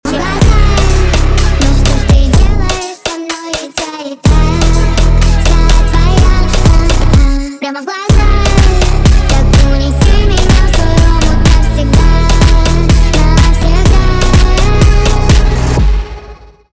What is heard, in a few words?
phonk